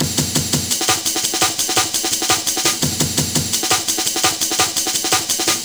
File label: Kickin Break, Drum & Bass, Beats & Breaks; Fluid Dynamics